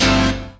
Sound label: Dance Mega Synth; Best Service; 90 s Sample CDs